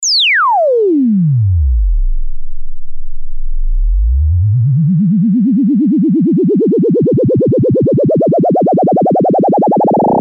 Full Sweep Lin FM; Io Instruments Themisto

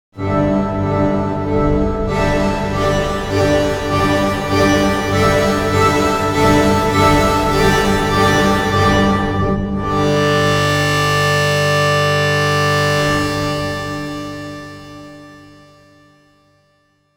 Reverb only; Waldorf Kyra

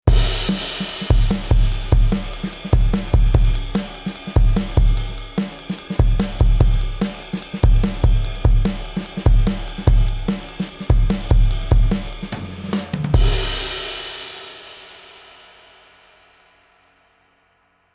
Cameleon Labs 7721 Drumbus peak HPF 200

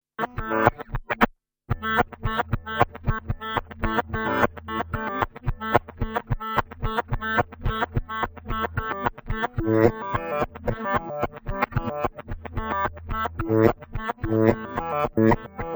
Reverse

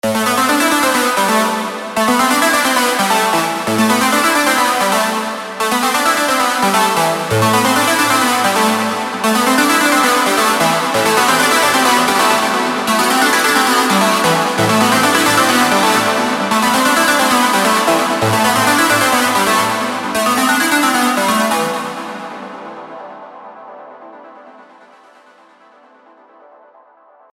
LD Vogue; Vengeance Sound Avenger 2; Factory 2

